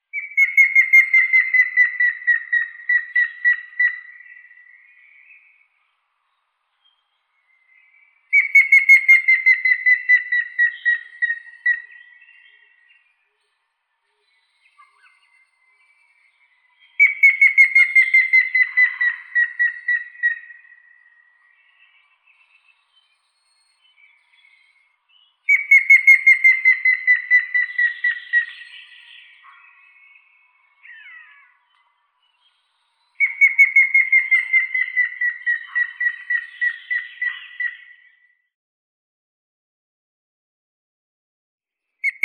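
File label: grey-headed woodpecker, Picus canus; dyatel sedoi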